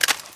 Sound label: usp clip out, weapons; garrysmod